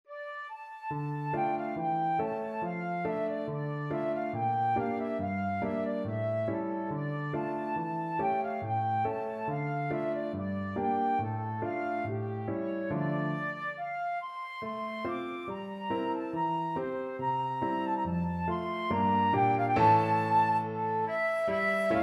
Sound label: gavotte flute